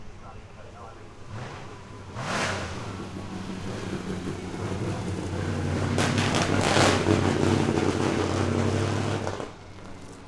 Mercedes-Benz W154 W25 descent

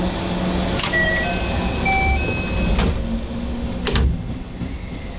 Door music

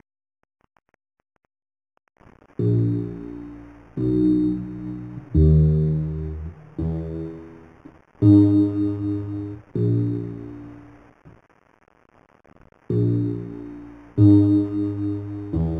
Begena Scale Plucked

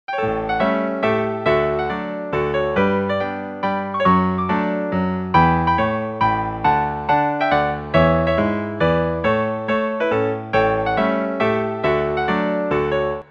Mazurka; Leo Delib

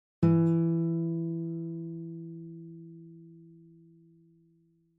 Guitar sound velocity 100

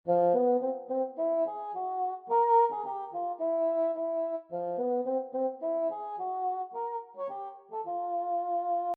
Melody; Pee Wee

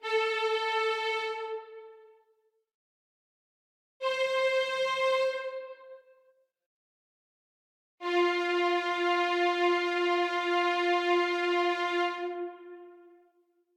Three violin notes

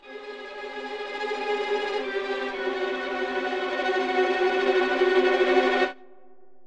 sound of fear

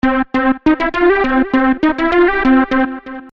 scared babybear theme

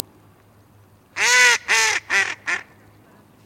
Anas platyrhynchos Mallard

